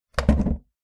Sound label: sport skateboard set down on wheels; zapsplat